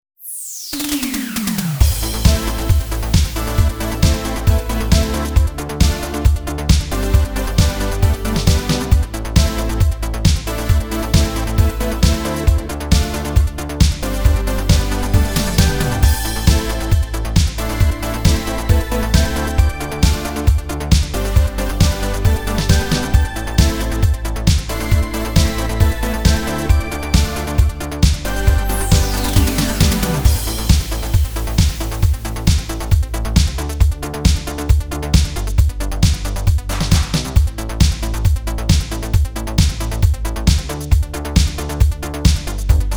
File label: New Retro Wave, retro synth wave